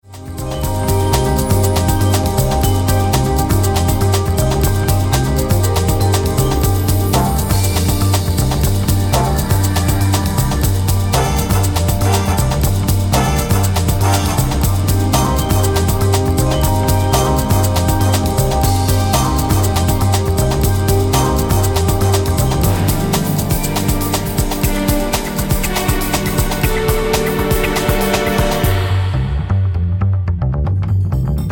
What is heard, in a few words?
rythmic pad